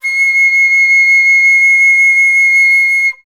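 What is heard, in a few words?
flutes C6; Best Service; Peter Siedlaczek's Advanced Orchestra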